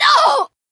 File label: Short Scream; Lewd SFX